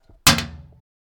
Hitting Metal

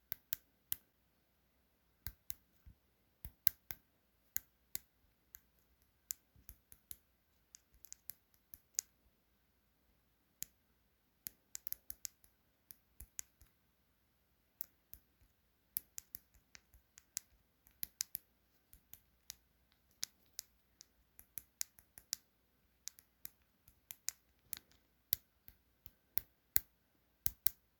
Typing With Acrylics On A Phone Screen

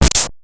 909 Snare

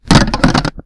Impact

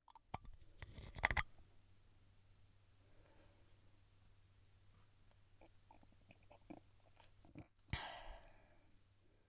Opening A Beer And Drinking

